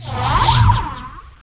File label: Imunity Sound